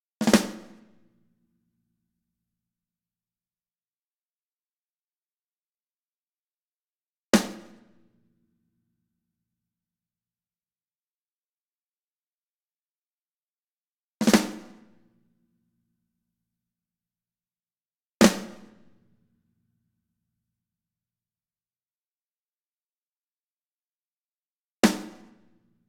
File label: snare